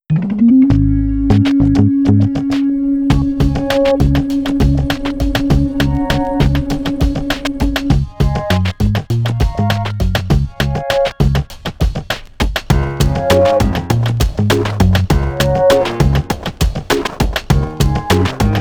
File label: Piano and Crud Groove; Party